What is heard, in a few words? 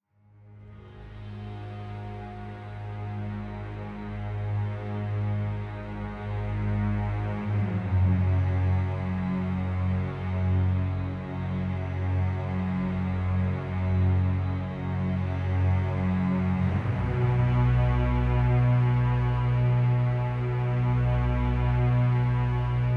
Doom Underscore